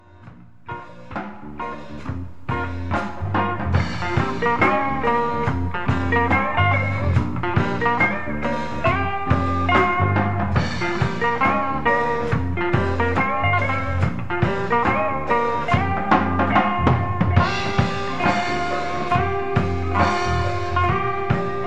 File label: acid house solo